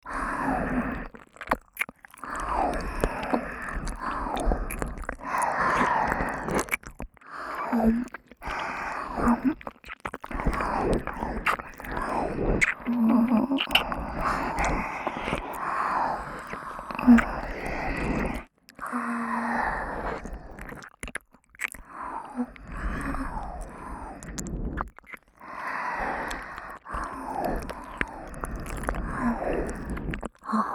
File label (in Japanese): 両耳舐め はむはむ